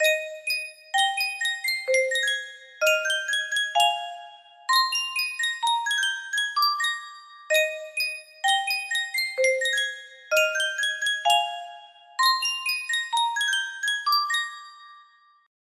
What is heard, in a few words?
Sankyo custom tune music box